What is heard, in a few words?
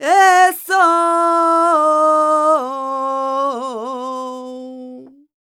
voc c, Flamenco, Vocals, Female Cantaora; ProSamples vol.46